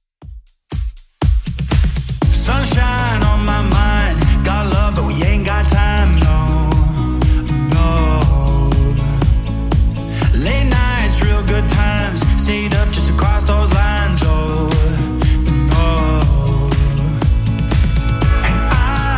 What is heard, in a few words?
Top40 120bpm; Xtend Edit